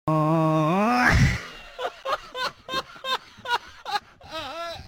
risas

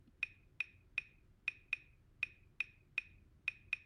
clave

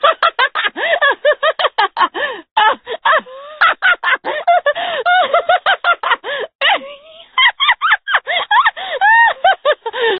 risa

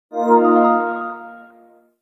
app notificacion

